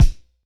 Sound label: Pound Clack Pad, Kicks; Airwindows